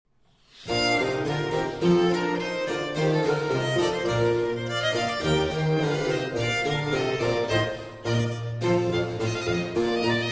Concerto For Hurdy-Gurdy, 2 Violins and Basso Continuo in C Major; I. Allegro